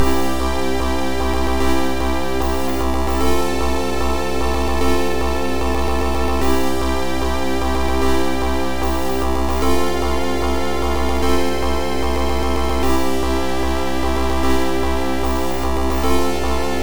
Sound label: RPG - Field